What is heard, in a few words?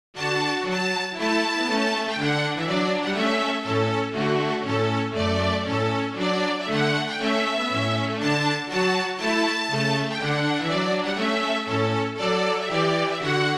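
Trio Trumpet Tune Purcell, Flute Trio Version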